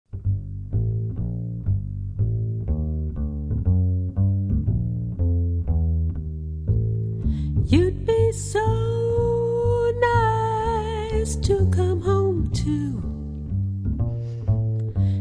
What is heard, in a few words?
Jazz Trio